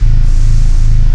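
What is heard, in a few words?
machine ambience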